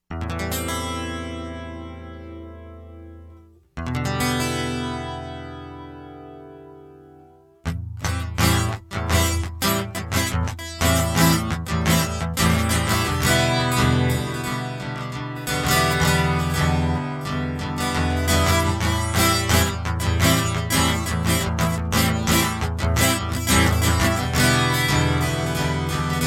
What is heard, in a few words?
Guitar Loop